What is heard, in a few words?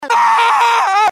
scream, male; gmod; npc